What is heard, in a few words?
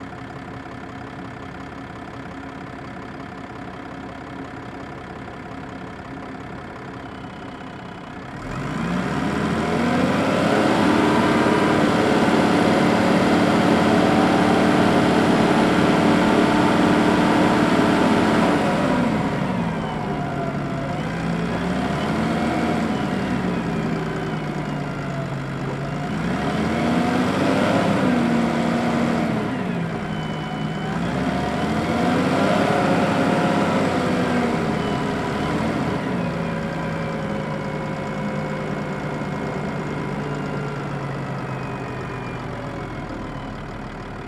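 Volvo L90 Wheel Loader Onboard Drive Gearshifts Stop Off Engine Right; L90c 1996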